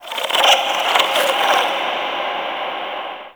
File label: Under City Small Creature; Ambience; Dungeons; One Offs